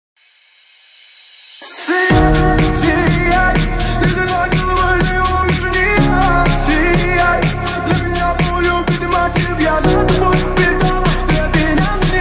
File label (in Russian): музыка в машину remix